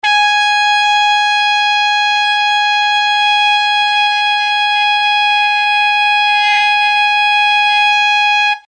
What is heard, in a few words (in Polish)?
F3, saksofon; aplikatura; in Eb